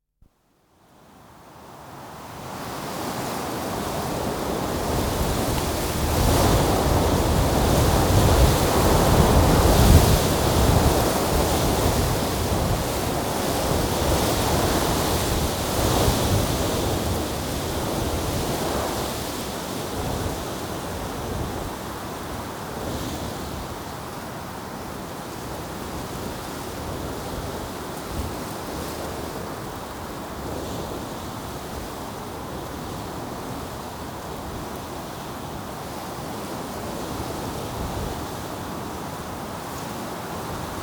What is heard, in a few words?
Windy Day At the Beach; Dr. Sound Effects; 210 Nature Sounds - 20 Hours of Relaxing Natural Ambiences for Meditation and Sleep